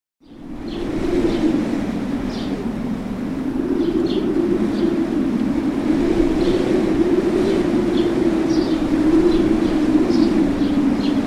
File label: Howling Wind In Highland Villa; Hollywood Edge Historical Series; Traffic Free BG's & FX